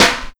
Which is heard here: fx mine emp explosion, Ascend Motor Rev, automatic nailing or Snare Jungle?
Snare Jungle